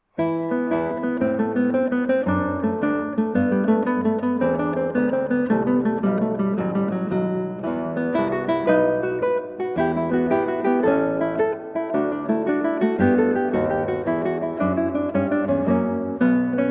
Vivace from Trio Sonata, Guitar; TWV 42 E8; Georg Philip Telemann

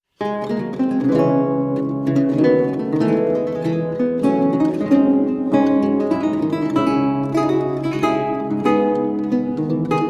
Courante; L'Imortelle from Pieces In D Minor And A Minor; Ennemond Vieux Gaultier

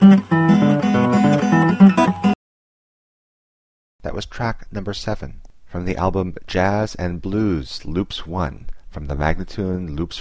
Jazz and Blues Loops; MagnaLoops

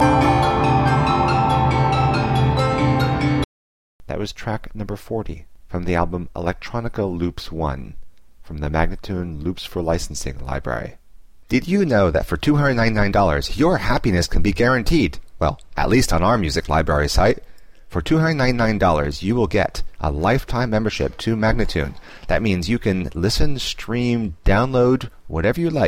140 D spoken; Electronica Loops 1

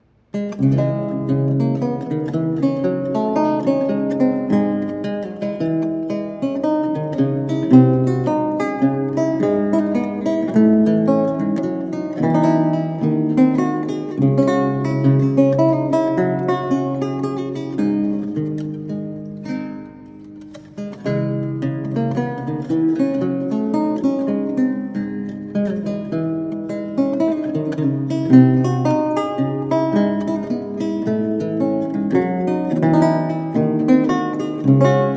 Courante in D Minor; Les Larmes of Johannes Fresneau

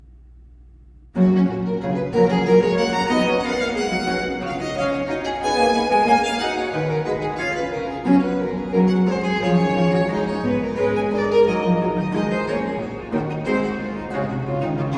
Vivace Sonata For Violin and Continuo In G Major; BMV 1021; Johann Sebastian Bach

